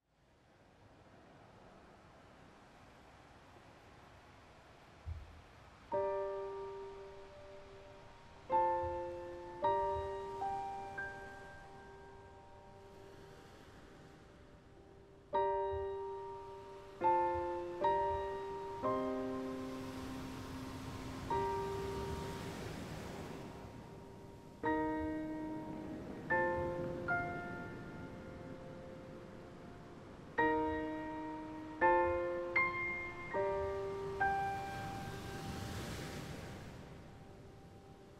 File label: Forest and piano